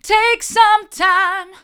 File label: take some time hard, vocal